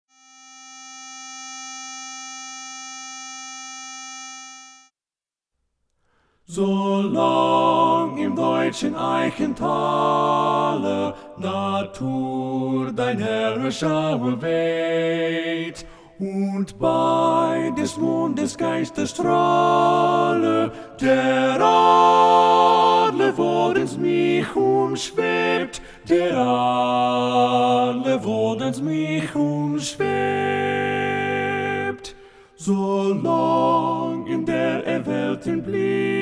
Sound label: Tenor Left; Junglingswonne; German